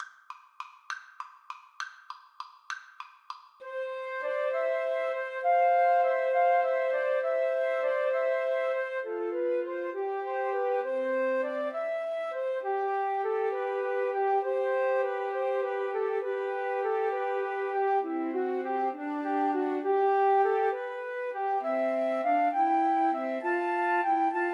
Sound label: Flute Trio